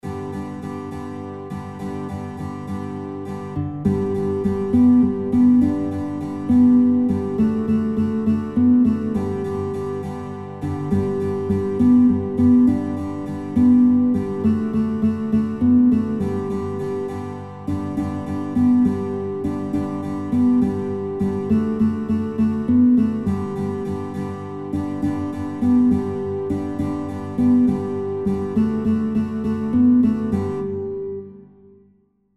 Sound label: Guitar; Guitar Songbook; Ich Geh Mit Meiner Laterne; kinderlieder gitarre playalongs